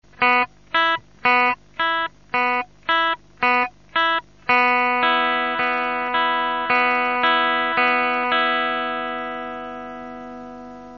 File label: ear tune